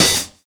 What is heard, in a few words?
Hi Hat; Sonic Foundry (Sony Creative Software); Drums & Drones; Construction Kits; Parox Kit